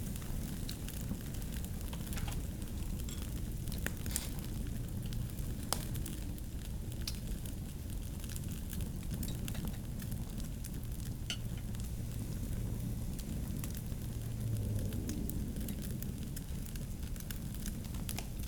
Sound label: Roasted Meat; LOOPERS